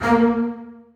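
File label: basses staccato a3; Sonatina Symphonic Orchestra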